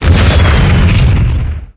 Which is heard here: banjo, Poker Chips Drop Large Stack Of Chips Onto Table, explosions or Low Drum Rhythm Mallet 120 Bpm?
explosions